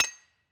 mine stone

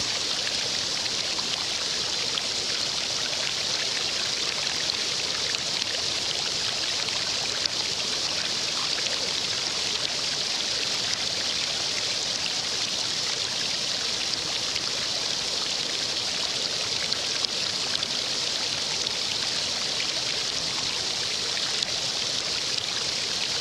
river fast, ambient water

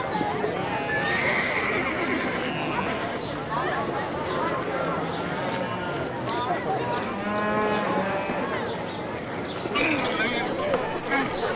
ambient trade, building

